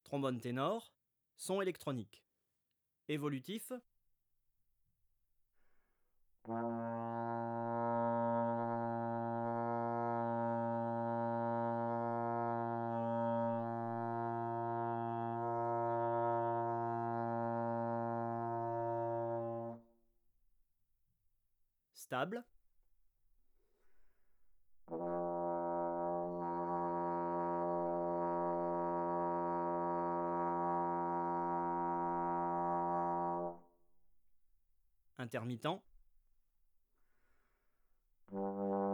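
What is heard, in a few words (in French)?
tenor son électronique, trombone; Textures; Sons complexes sonores; traite orchestration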